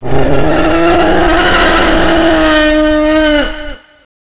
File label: bronto scream; lost world